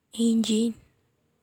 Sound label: Engine